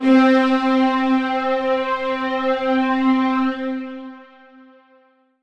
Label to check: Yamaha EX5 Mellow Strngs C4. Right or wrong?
Right